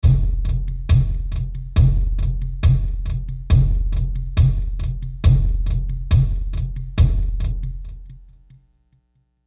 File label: Tribal Rhythm, Tribal Rhythm Low; Drum; Musical; Drums; Blastwave